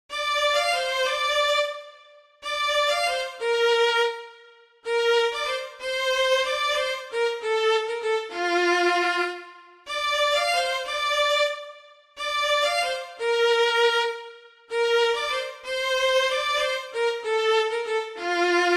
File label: piano; prabhas trisha